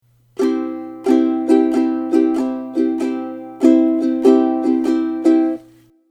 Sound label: swing, ukulele